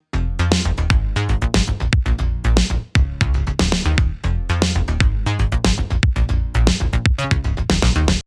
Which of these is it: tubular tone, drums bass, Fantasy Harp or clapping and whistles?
drums bass